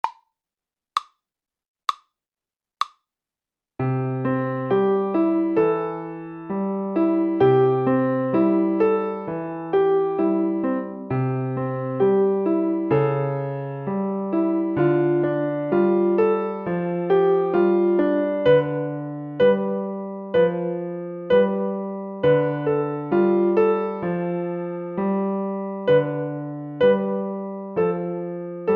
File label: Ragtime slow